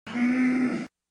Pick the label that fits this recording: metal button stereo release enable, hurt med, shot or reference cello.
hurt med